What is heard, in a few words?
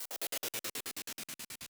chop chop 140